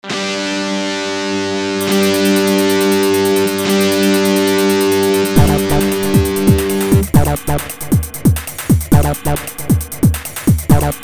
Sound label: beat